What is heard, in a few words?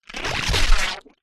science fiction impact alien body hit hard crack squelch bloody